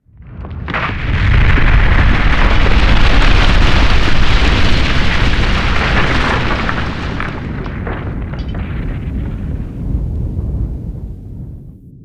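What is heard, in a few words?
explosion building distant; Explosions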